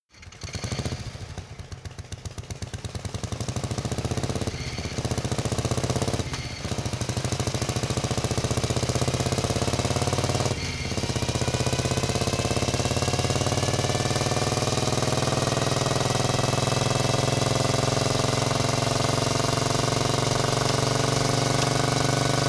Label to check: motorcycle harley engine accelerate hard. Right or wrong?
Right